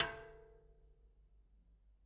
Bicycle Spoke

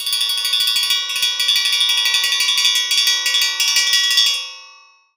Metal Teapot Stand Tremolo

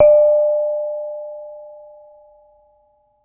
Kenong, Gamelan

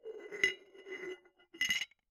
Bottle Struck

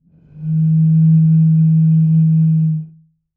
Bottle Blown

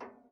Ping, Kitchen Toaster

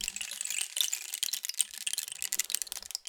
Rain Stick, Percussion Samples

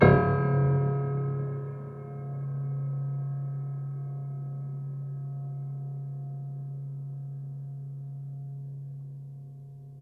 Harm Ped Low, Piano Harmonics

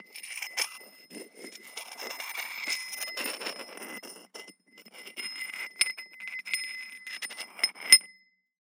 Hole Saw Scrape; Hole Saw Bits

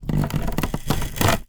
Polystyrene Creak

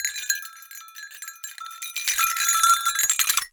Cowbell Chain, Percussion

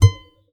Classical Guitar Extended Technique

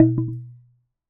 Wooden Bar Bounce

